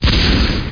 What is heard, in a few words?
shot; PC Gamer IT CD 35